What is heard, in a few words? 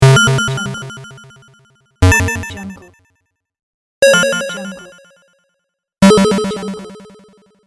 retro invader